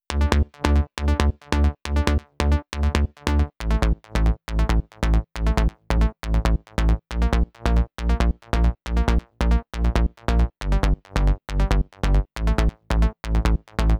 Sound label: 137BPM Bassline DRY, D minor